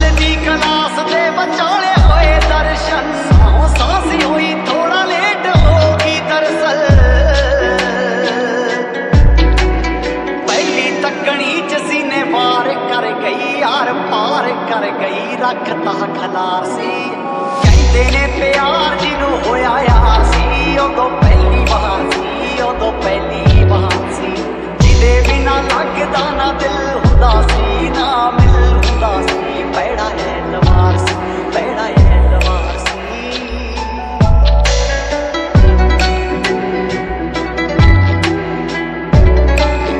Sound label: Lofi, Punjabi